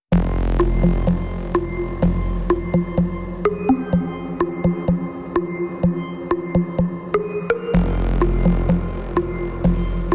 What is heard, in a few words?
Autonomy Engine